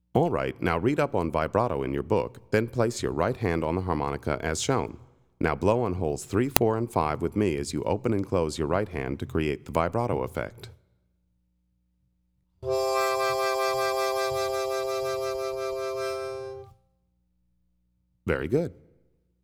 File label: Vibrato, harmonica; Play Harmonica